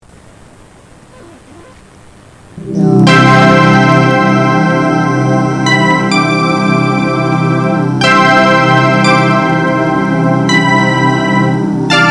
atmosfera

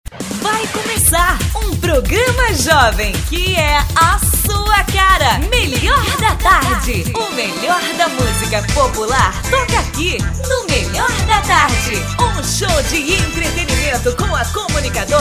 abertura; melhor da tarde

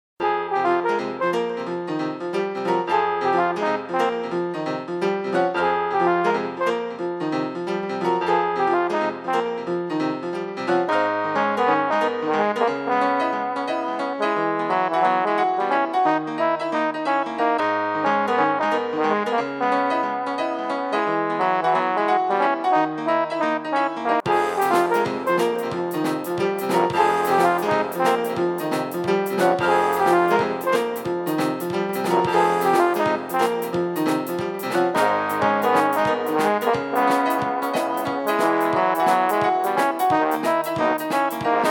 esquentando o jazz

